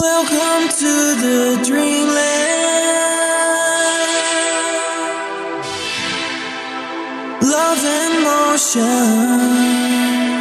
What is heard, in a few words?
Disco Chill Vibes, Evening Disco